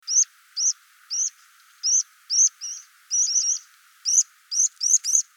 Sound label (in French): pipit maritime